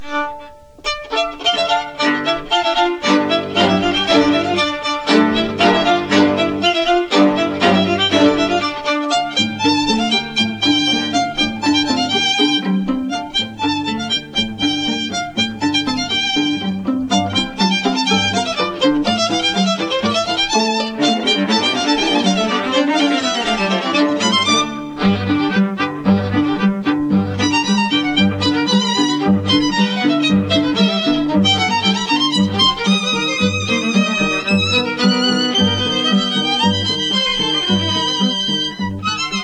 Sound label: bulbul quartet